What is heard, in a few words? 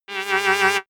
sci-fi scanner wobble high